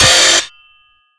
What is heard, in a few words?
manhack grind; npc; Half Life Alyx